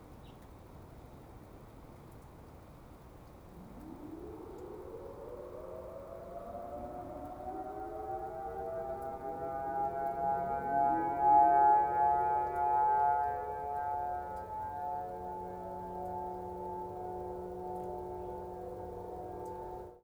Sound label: 1st Siren